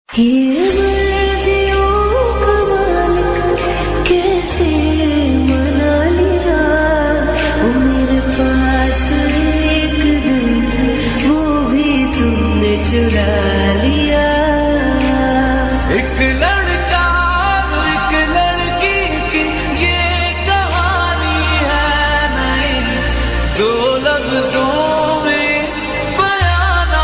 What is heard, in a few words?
hindi ringtone music love story ringtone music